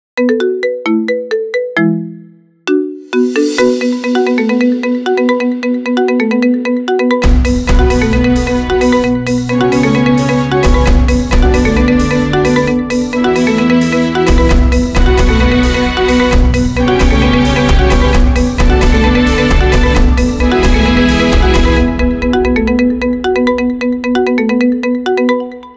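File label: Ringtone Marimba; nhac chuong; Zenitsu; Demon Slayer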